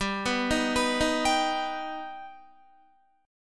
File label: G Augmented, Guitar, chord; G+ arpeggiated; 4F,4A,5C,5G,5C,6E; 14,14,15,16,15,15